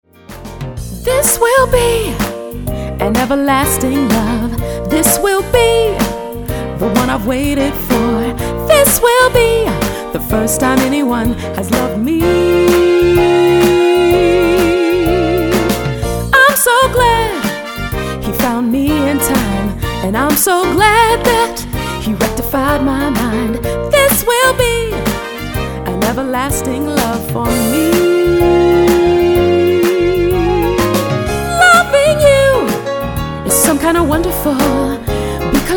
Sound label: Singer